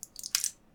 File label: Pill